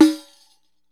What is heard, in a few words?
req open; Deff Bendir &Req